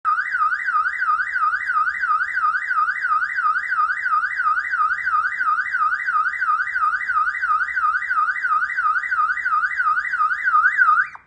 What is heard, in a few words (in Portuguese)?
sirene alta potencia